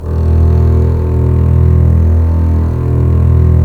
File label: STR, Roland LA Composer